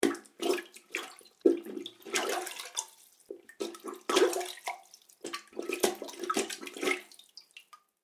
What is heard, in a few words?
Household Toilet Plunger Long, bathroom